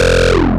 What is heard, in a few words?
Synth Shots; Vengeance Samples